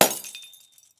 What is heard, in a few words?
light break; Apotheorasis